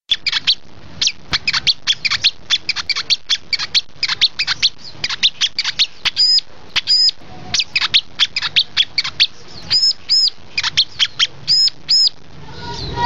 kolibri sriganti

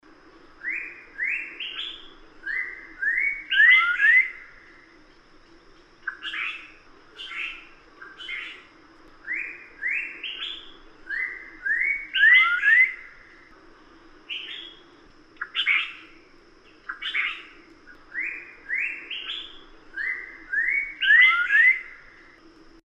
suara pitohui belang cucak rotan

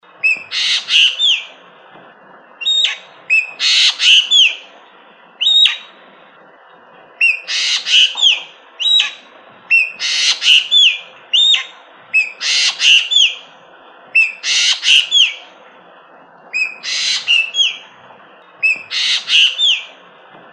variasi ekek geling

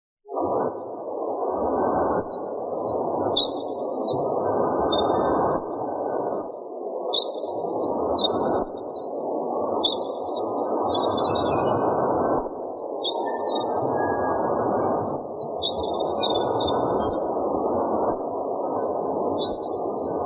suara kacamata enggano

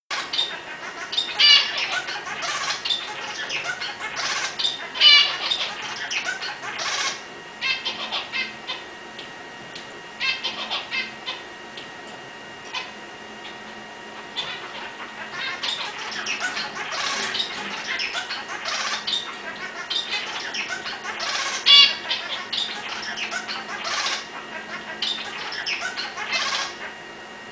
suara societyfinch

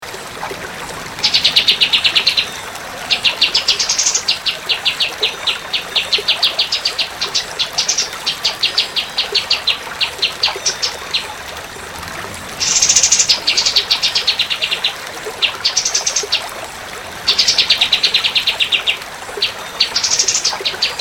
kapas tembak

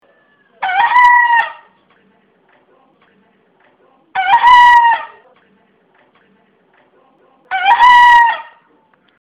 bekisar

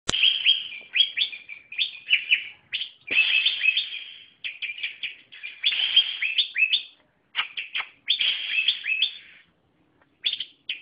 cucak hijau kepala kuning

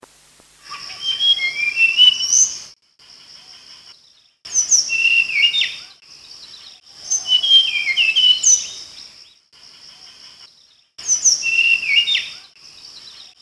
sikatan bakau

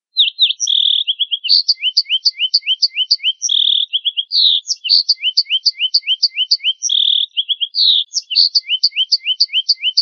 keluarga kenari yang lain

